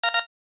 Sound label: retro beep; sci-fi sfx